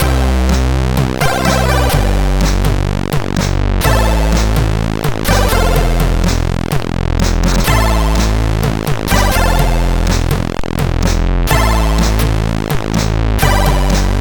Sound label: Short Tune, CSG8580R5; hvsc